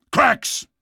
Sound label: cracks; combine soldier; hl2